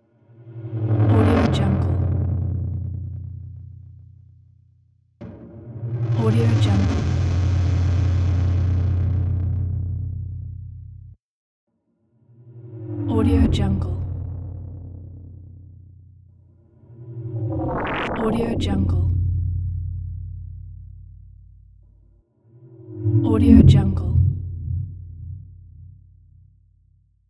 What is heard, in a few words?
cosmic transition; spacelabstudio